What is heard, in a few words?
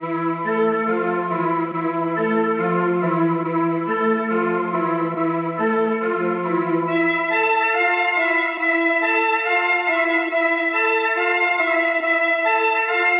Drill